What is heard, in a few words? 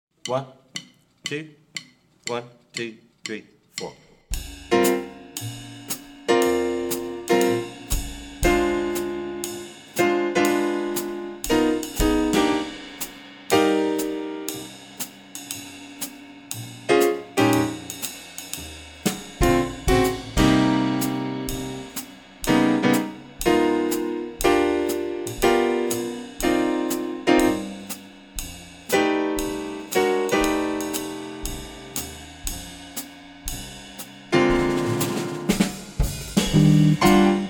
swing etude bass muted